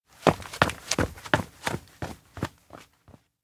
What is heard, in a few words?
steps indoor chase; anemoi パッケージ版 1.1